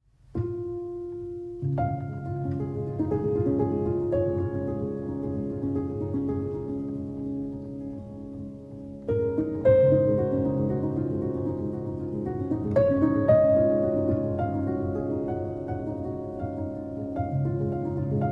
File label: Prelude in D-Flat Major, for Piano; Op. 28, No. 15